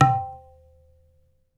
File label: Tablas; Spectrasonics; Supreme Beats; World Dance